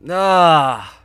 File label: disgust